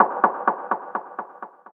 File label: Impact; 7rystan's 600+ FREE EDM SAMPLES & DRUMS; SFX